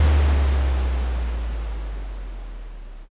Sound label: distant thunder